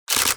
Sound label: shutter; speed